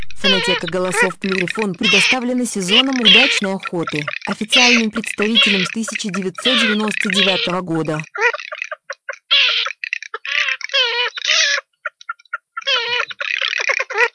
Чирок-трескунок

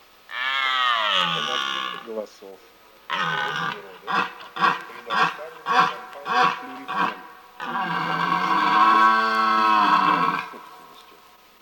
Олень Cervo europeo

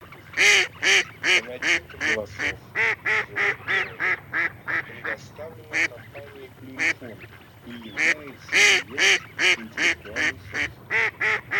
Кряква Germano; plurifon

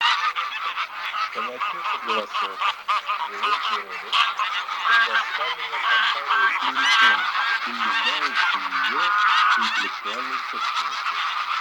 Гусь серый базар Oche Selvatiche

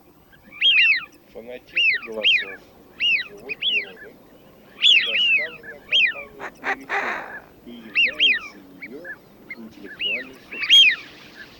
Свиязь Fischione; plurifon

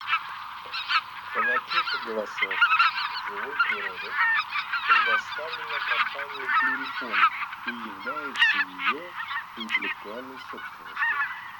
Белолобый базар Oche Lombardelle; plurifon

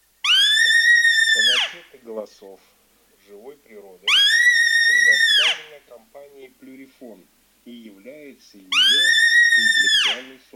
Косуля самец Capriolo forte; plurifon

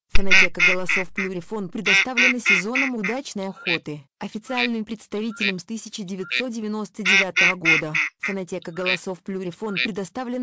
серая утка; plurifon

